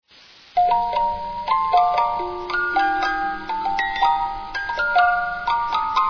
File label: bells mary; Reuge